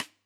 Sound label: K1 close SdSt, drum